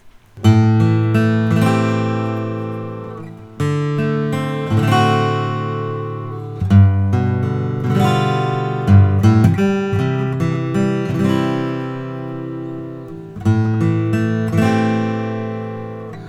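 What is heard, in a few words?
bourgeois oms adirondack macassar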